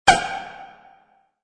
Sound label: Ball Shot; Nomal